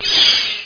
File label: monster scream; dr strange